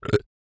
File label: burp; OPENAIR; Anechoic